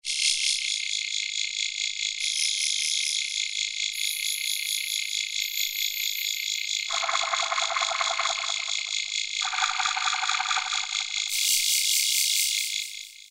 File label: Insects, E-mu Proteus-1 Presets